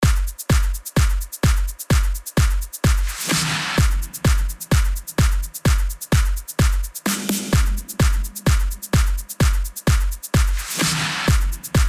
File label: Drums FX